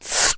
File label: Steam med mouth